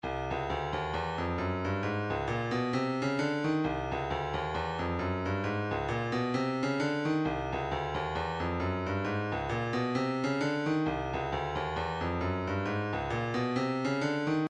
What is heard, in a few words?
133 shaker, midi